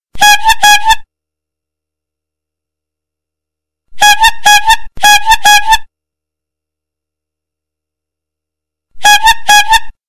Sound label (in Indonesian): suara burung beo